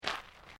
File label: FOOTSTEPS SNEAKERS GRAVEL FINE STEP; Footstep Foley; Human